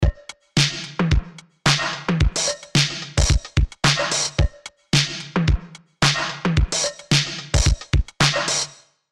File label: Drum Smash; Arturia CMI V; Dist TUBE CULTURE